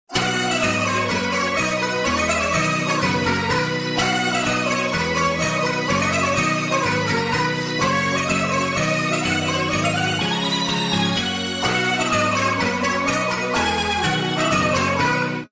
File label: x mas chords lalala, ringtone